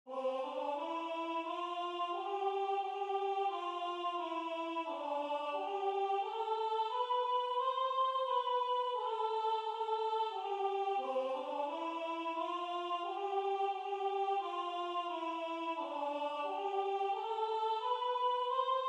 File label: Soprano